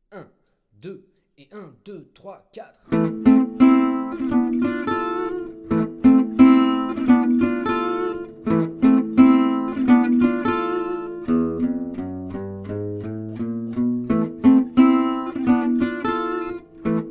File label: Manouche; Mel - Am; MyVirtualBand